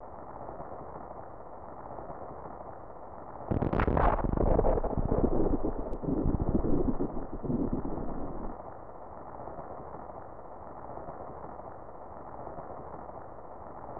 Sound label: Crateria Rainstorm; Metroid Prime Series; Super Metroid (SPC2FLAC)